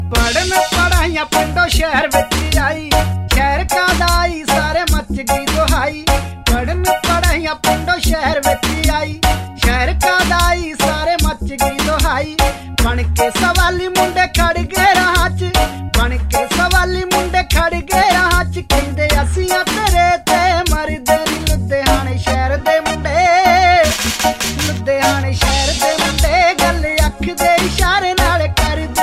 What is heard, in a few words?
Punjabi